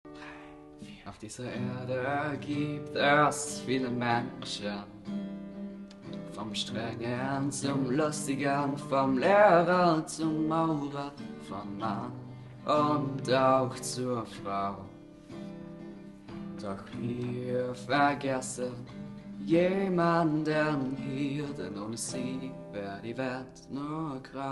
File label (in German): Song